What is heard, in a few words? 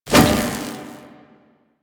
Whoosh; Warframe; Quests; The New War; Drifter